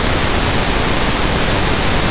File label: tv noise